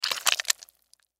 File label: Squish Fruit Food, Squish Fruit Wet Juicy Squeeze; Squishes; Foley